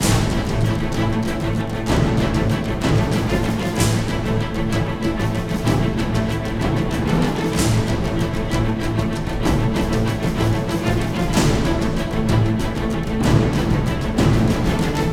Combat

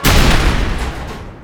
Enemy Tower Destroy; Disney Star Smash; Android, iOS, Mobile

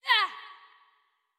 Charge Release; Jackie

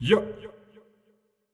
Effort Tiny; Marv

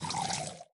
Cruiser Pour Oil; lethal company

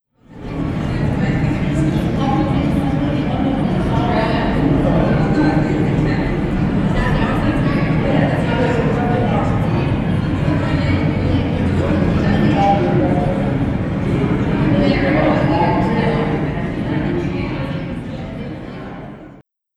Cities vegas Walla

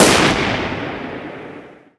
Troll Lobber Shoot Fire

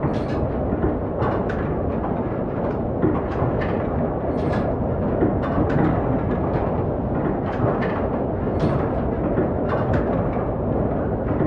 windmill mill loop

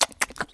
Feed; Melonbra; Fifi; Neighbours From Hell Console Edition; GC, Xbox

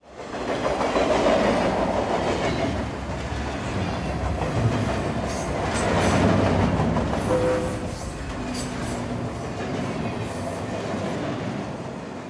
Dierkow Train

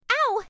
Bumping

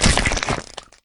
Giant Kiwi Kill Player; lethal company

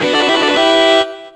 GAME START; WWF In Your House; Saturn

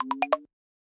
Curious Sweetener Short

